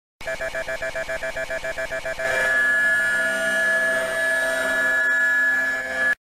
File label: Fatal error FATAL LAUGH